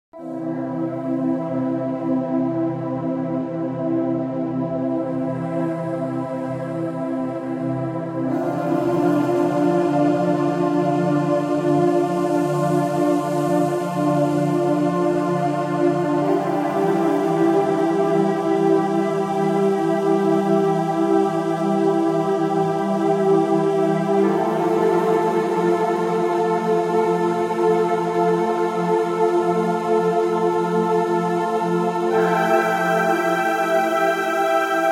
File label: Space Colony Pure Ambient; Ceres